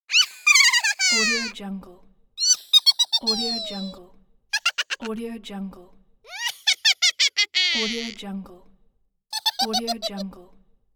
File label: cartoon evil laugh